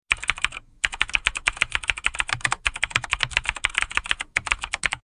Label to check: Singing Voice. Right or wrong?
Wrong